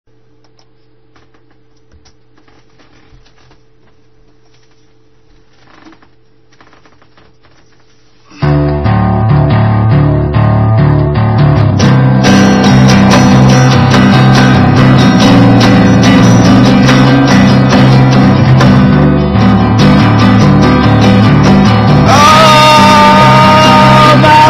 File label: Acoustic Bass